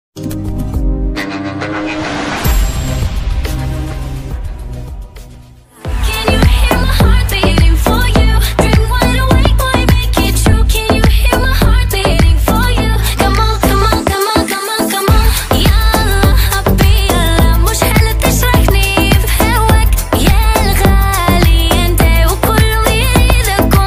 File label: bgm ringtone remix